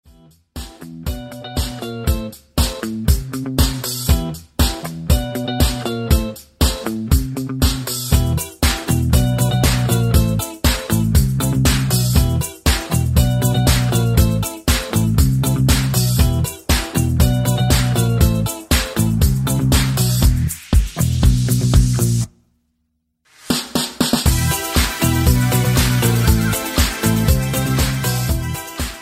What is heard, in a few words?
instrumental